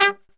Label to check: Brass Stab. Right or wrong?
Right